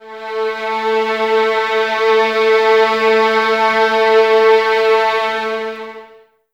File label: VS A 3, violins, string ensembles; Miroslav Vitous; 11 Violins